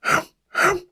hamuhamu

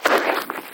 zusa

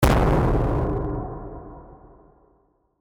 syoutotu

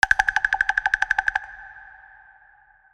doll katakata

